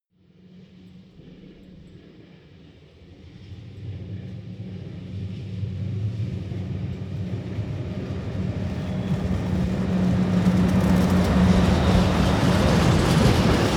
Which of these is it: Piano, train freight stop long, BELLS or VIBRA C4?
train freight stop long